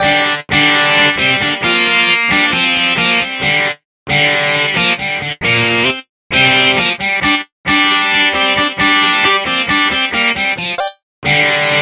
only riff

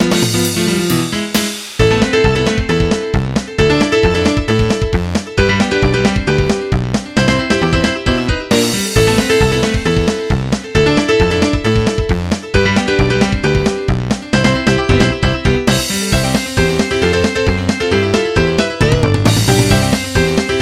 rock remix

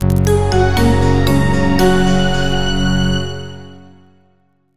Startup Boot Theme; MIDI; Edited using FL Studio

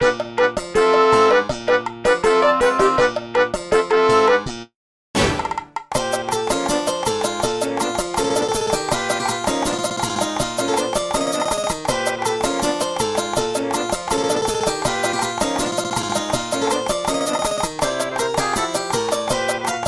Samba; Chocobo

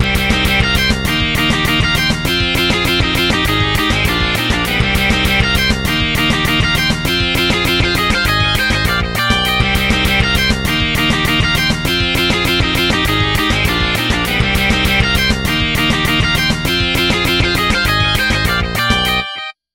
Character select

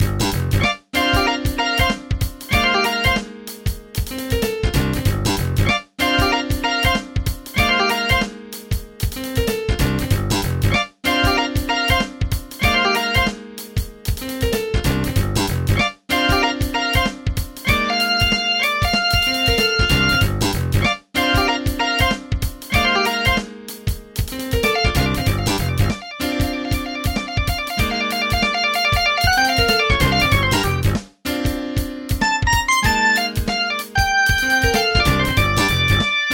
Bar Music

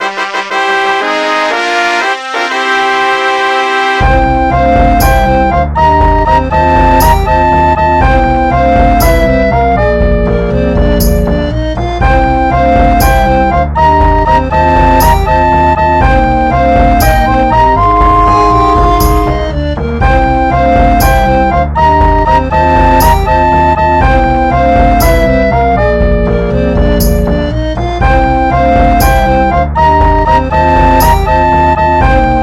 Victory Fanfare

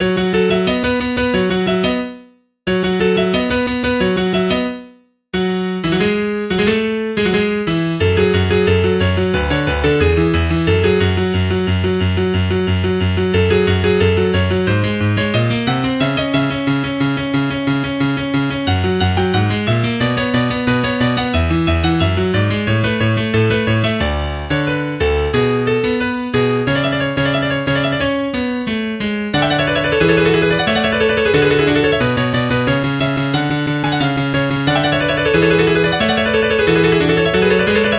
Piano; Critical Hit

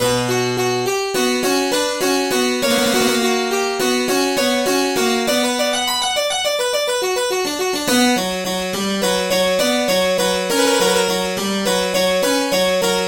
Sinfonia in B minor

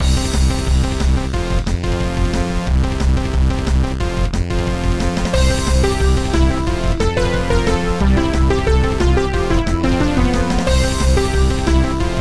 Techno Remix

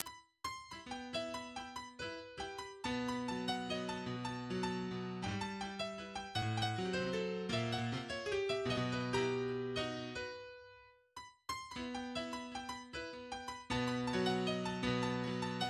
Waltz No 2 in e minor

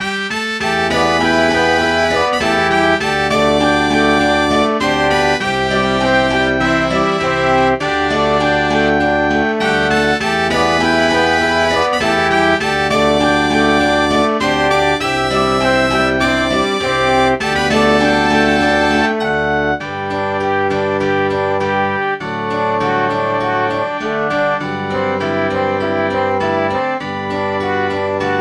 Brass Trio

